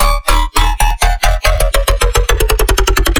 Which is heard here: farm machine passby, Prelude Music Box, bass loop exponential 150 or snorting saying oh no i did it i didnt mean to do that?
bass loop exponential 150